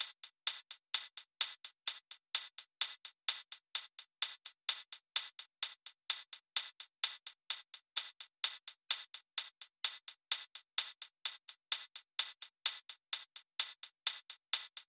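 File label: Drum Loop 128BPM hi-hat Add; VEC5 Drumloops; VEC5 Multi Loops; Loop Elements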